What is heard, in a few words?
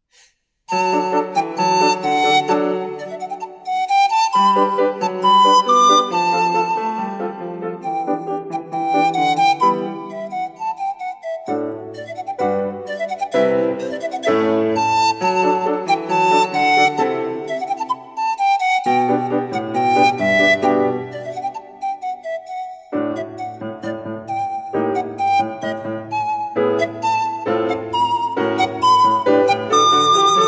Sonatina for flute and piano, Toccata